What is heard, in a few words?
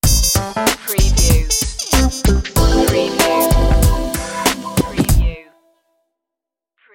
funky summer stinger